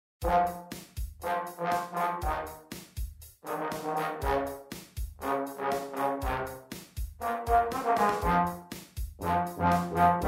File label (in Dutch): Brass Kwintet; Sonic Boom; Snake Pit